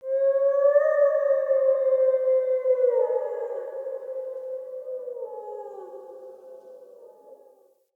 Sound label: Quest Wolf Cub Howl Stinger Breadcrumb; Animals; Wolf Cubs; Howl Stingers; Orengal